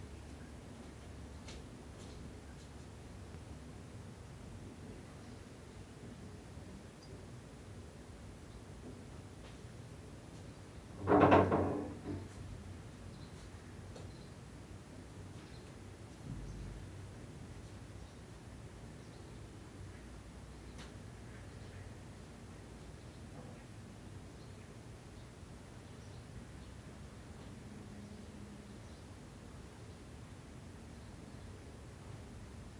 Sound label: Room Noise House